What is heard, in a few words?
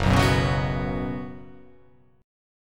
F sharp Suspended 4th